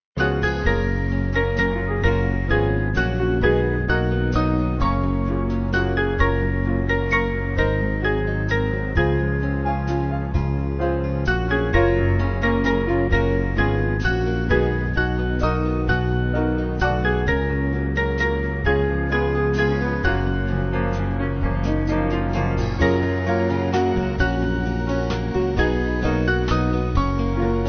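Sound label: Band, Music Backing Files for Churches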